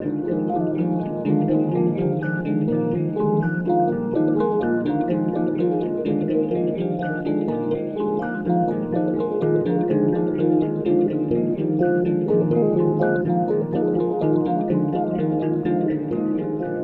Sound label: Guitar Textures